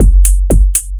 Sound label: 4 floor beat, trance; Sonic Foundry (Sony Creative Software); Trance NRG VOL-2; Drums; Complete 4 On The Floor